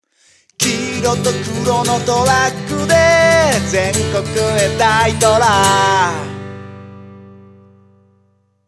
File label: soundlogo; daitora